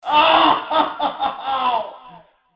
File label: scream